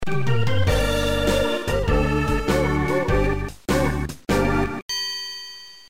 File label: game over